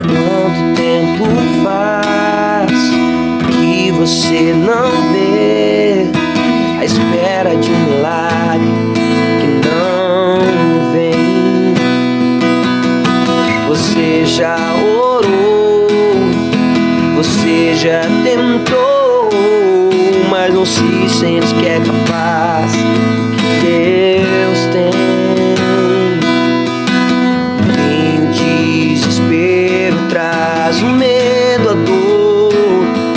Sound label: gospel